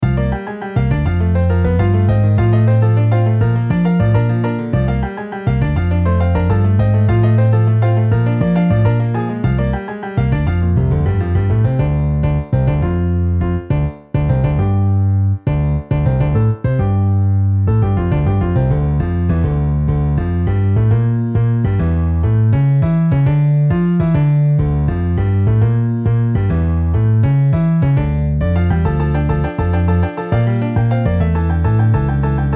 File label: Piano Bass MIDI